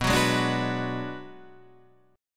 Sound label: B Minor 6th B Guitar Drop D, chord